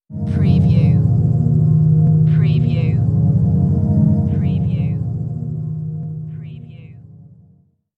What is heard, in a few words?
Scifi Audio Logo Ident, Submerged, Restrained, Dark Glow